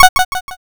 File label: cancel